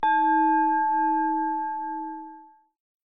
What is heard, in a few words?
Bells Silent meditation